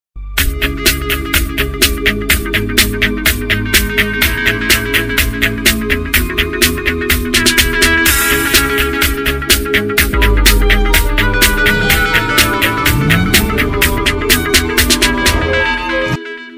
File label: Oppo Reno6 Z Official Ringtone